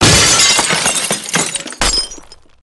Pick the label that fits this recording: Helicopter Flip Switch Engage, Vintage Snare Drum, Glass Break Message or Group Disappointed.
Glass Break Message